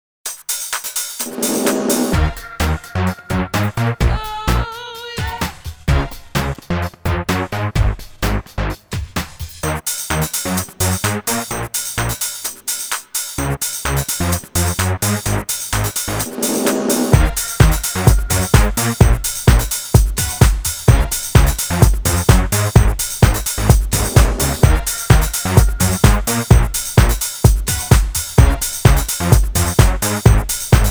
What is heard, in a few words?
Thump